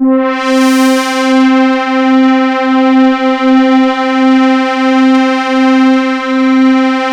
MONO PAD; PADS

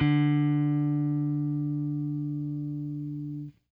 FENDER SFT, Guitar; Quantum Leap

